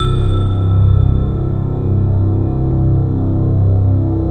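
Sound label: C 1, BELL STRINGS; Best Service; Advanced Media Trax Modern Composer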